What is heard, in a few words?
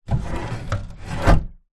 household window wood frame old fashioned open then close